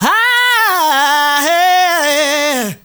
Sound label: MALE SOLO, Heart of Africa